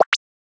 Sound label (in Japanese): ピトッ